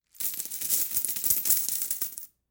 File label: Coins Drop